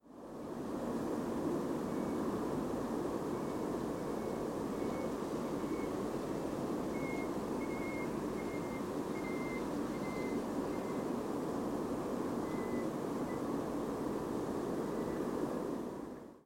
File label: odd duck low whistle